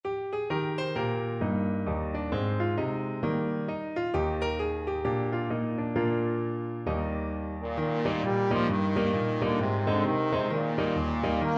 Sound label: all for me grog, trombone